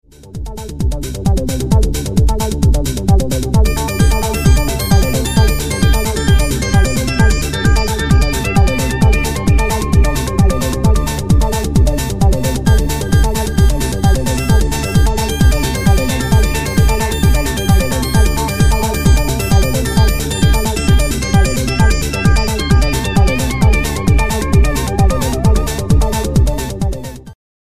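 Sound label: Randomize Note, analogue sequencer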